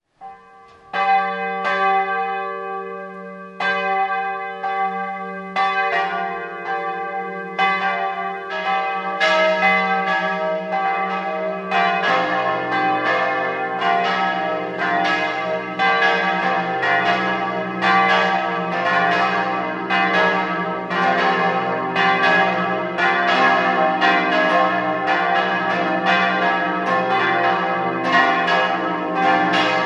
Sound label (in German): Theissing, Pfarrkirche, glocken; St. Martin; Ingolstadt; 19. Jahrhundert; Gießerei Pascolini